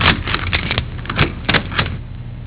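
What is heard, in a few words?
UNLOCKING, DOORS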